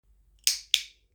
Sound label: Click